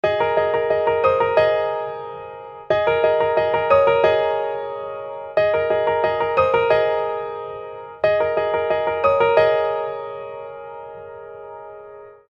Alarm Impatient; Samsung Z4; Alarms

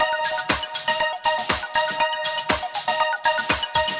ringtone, electro; Google; NewWave Labs

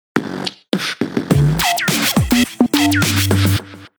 Beatboxed, ringtones; Sony Ericsson W660i

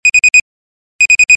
Low Battery; Fly; IQ449

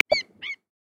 Galapagos Hawk; Xiaomi Redmi-Note-8-Pro

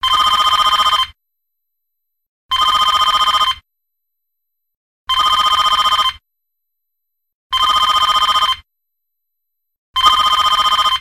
3D Phone, ringtones; SKY IM-A770K-VEGA-Racer

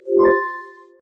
meteor, notifications; ZTE Grand-S-2

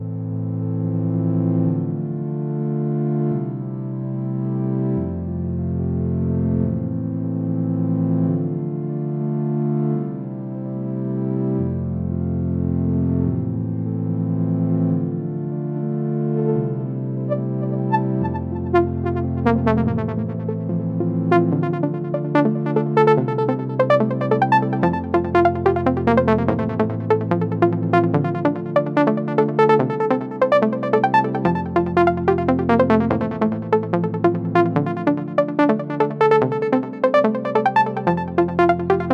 alarm, ringtones; sound of oo website ringtones

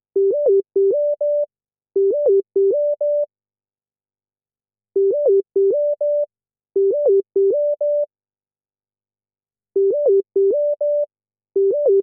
Digibuzz; Nokia; PlusFourSix; Lars Edvall

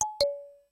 Charger Remove, ui; ZTE Quest-N817

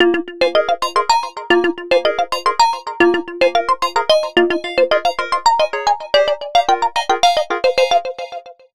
Strange Melody; Nokia; PlusFourSix; Lars Edvall

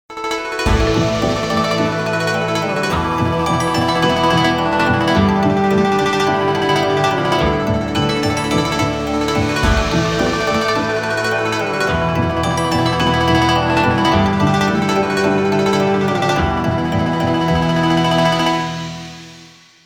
Chinese videoringtone; Nokia; Audio themes

